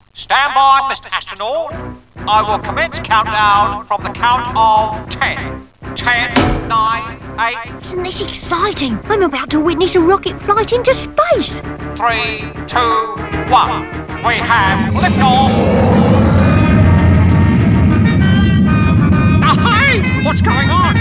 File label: liftoff; classictv; jimbo